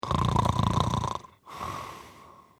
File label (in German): vox schnarchen